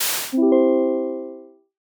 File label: end card soft close; daily life